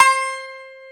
Baglama C4

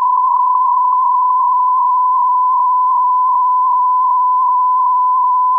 Tricorder Feedback

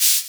Shaker; Equipped Music; Loungin House

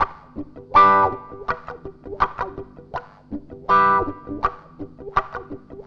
WAH, wah wah gtr; USB Soundscan vol.31; Miro s Grooves; AKAI

